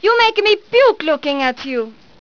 puke

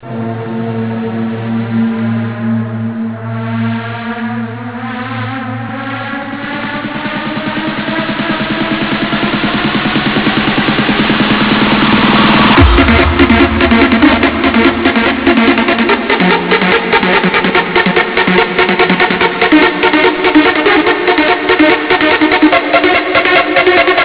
intro sound

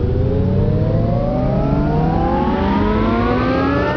boss powerup

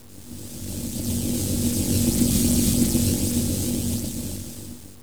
electric buzz

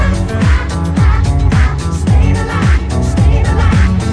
disco

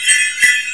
bells

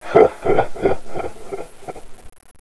laugh

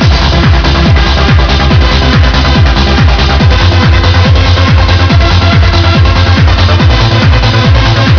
loop, radio loops

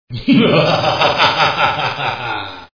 evil laugh

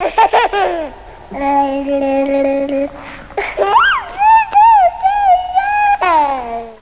baby laugh

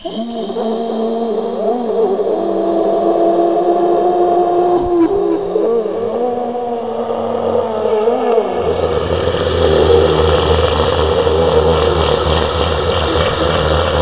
boss die

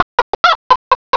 chicken

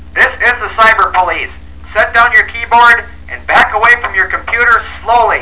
police

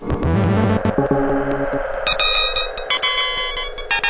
error

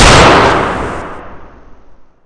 rifle, ambience

